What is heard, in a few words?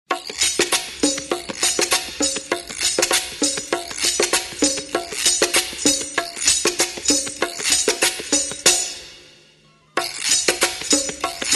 Percussion